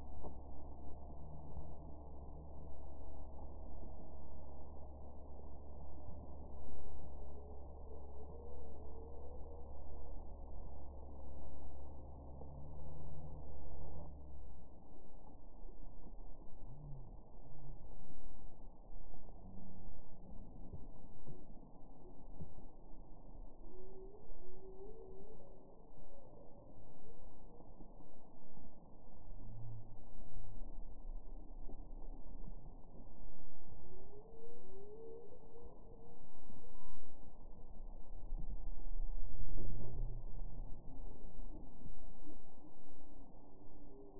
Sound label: humpback